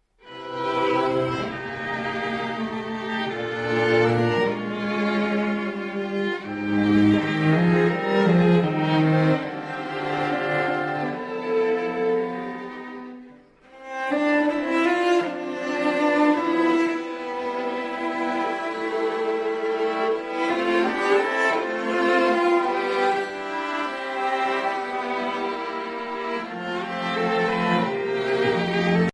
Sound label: strings